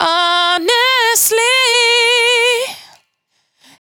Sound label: 92bpm Bb, Velvet Vocal; Zero-G